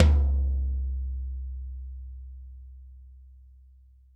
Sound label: Toms; Bob Clearmountain Drums I